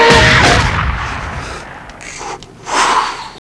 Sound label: bang